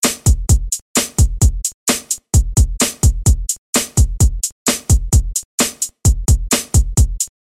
boompap

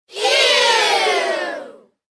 ewww

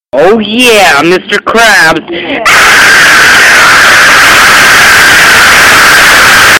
oh yeah victory screech

